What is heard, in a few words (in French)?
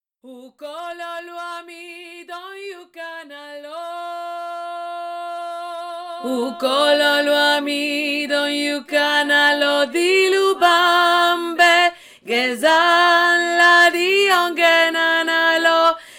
voix milieu